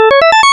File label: level up